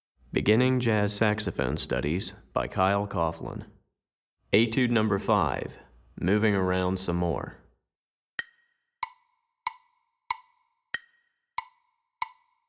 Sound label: Tenor Etude, Saxophone; Recordings For Beginning Jazz Saxophone Studies for Tenor, Volume 1; Etude #5; Jazz Articulation